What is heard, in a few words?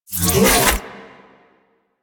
Briefcase Scythe Unequip; Crp Briefcase Scythe